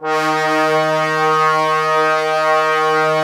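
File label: brass trombones D#, Woodwind Brass Ensemble; 90 s Sample CDs; Miroslav Vitous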